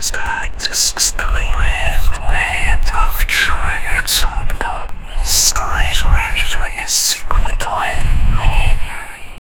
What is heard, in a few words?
eldritch whispers, supernatural